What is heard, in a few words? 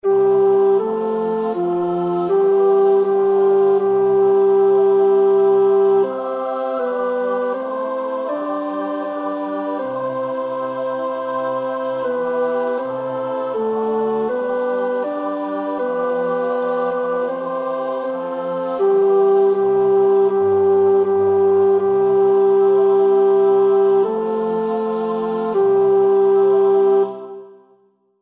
tenor; Maunder (John Henry); Bethlehem; Deel 1 (The shepherd's gifts)